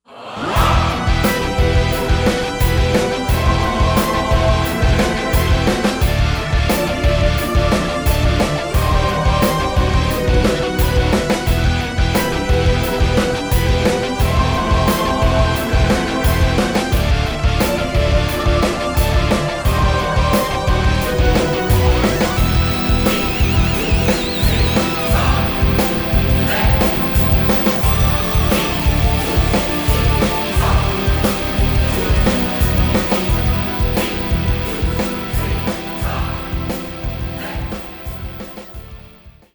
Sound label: Metal